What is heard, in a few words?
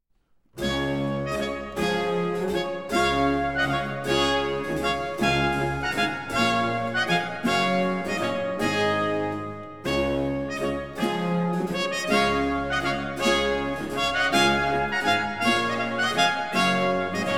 trumpet air; purcell henry